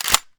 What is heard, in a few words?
ch back; weapons; Urban-Coalition; Decay; arccw ud